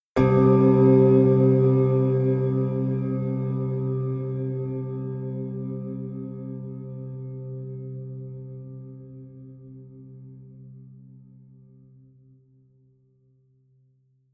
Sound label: Meditation Bell